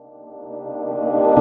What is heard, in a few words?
Chords Tones; Equipped Music; Loungin House